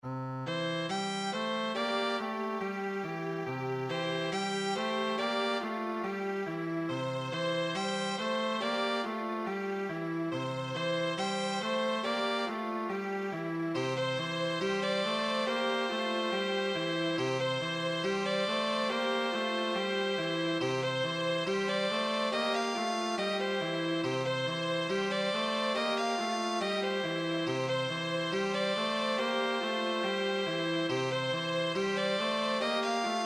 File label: string Trio